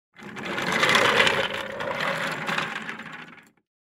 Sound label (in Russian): ofisn stul